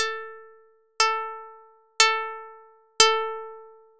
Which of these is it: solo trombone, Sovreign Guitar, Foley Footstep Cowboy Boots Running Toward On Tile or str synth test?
str synth test